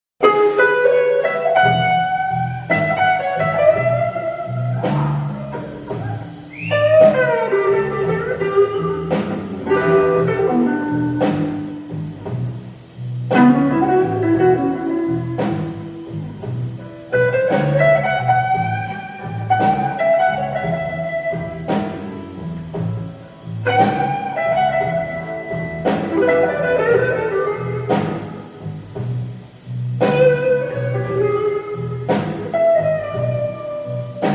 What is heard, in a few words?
blues